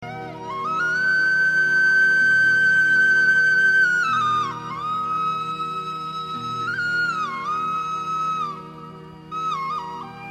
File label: sindhi bansuri flute